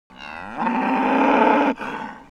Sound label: Feed denial chaos heifer